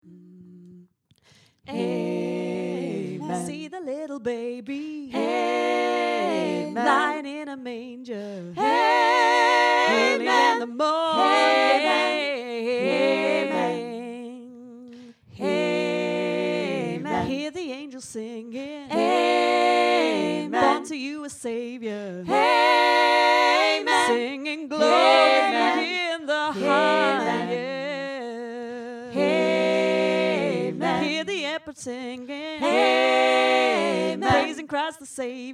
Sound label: Christmas amen